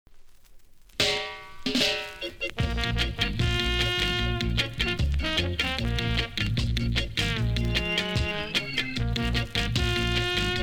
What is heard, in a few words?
REGGAE TO REGGAE